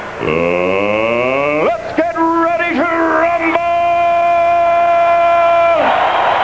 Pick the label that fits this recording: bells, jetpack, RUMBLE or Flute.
RUMBLE